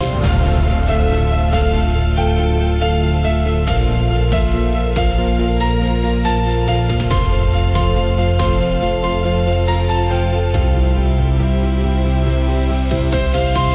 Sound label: loop; bgm